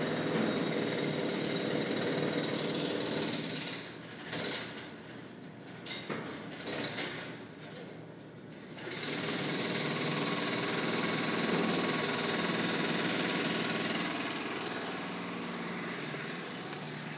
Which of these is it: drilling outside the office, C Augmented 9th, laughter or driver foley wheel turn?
drilling outside the office